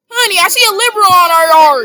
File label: long reverb wet fart